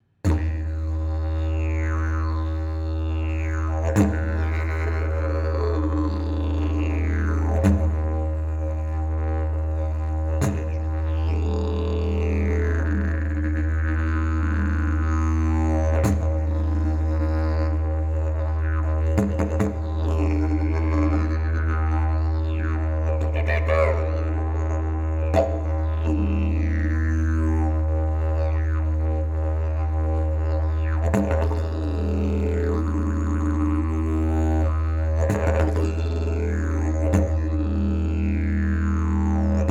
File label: didge